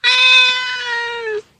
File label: cat sweet voice; animals